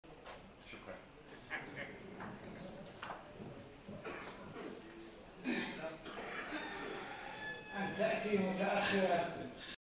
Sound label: loop